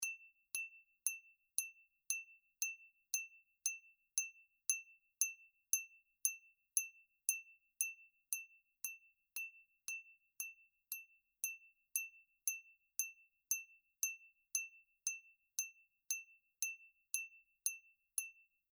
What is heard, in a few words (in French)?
Verre